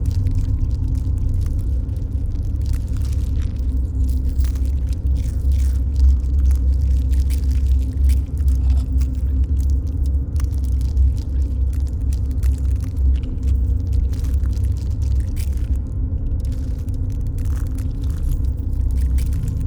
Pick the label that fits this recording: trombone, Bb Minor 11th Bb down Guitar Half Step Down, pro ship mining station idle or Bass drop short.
pro ship mining station idle